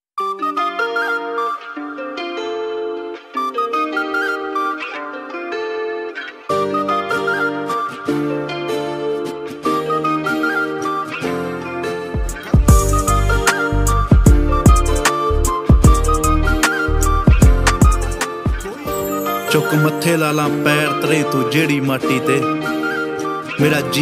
flute music flute bgm ringtone

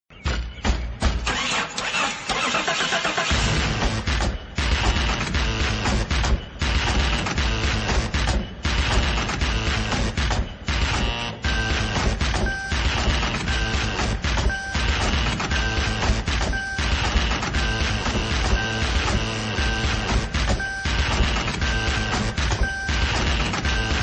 techno jeep ringtone

